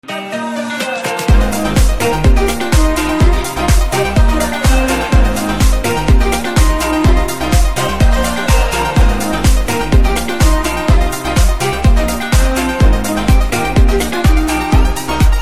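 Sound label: background music Telugu Ringtone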